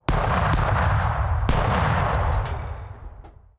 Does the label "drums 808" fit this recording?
No